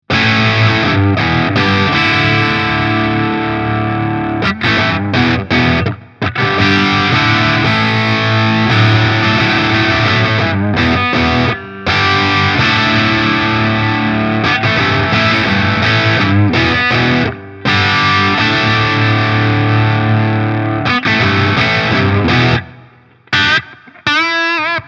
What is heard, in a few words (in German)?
Asat Deluxe Steg Humbucker verzerrt; GL Tribute